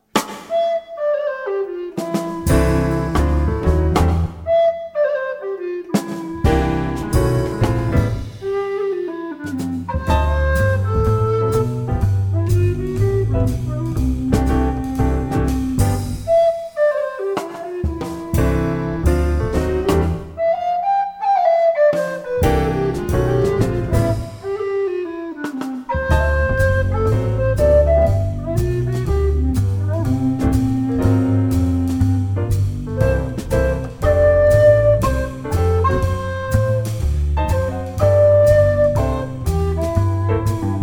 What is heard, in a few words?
Tenor, recorders